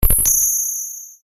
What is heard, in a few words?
UI Commit, User interface feedback sounds; Computers (Sound Effects:Electronics)